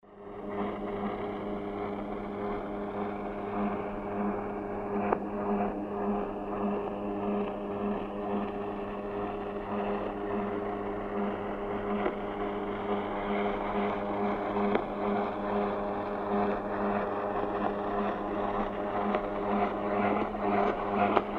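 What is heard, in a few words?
jet ski approaching shutting off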